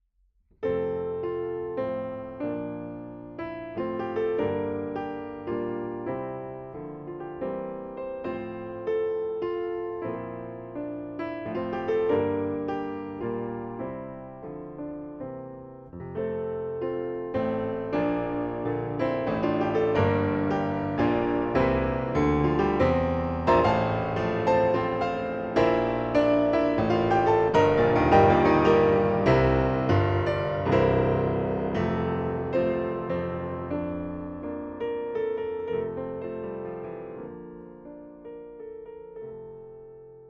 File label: piano TLM 103